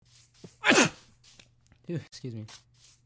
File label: sneeze